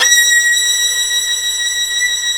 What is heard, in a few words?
Synclavier Strings; ILIO